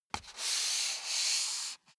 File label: step barefoot wood parquet slide long; schritte; Footsteps